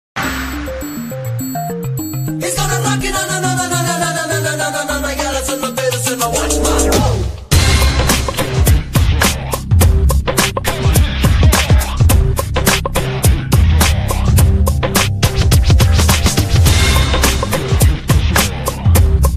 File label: anniyan remo bgm ringtone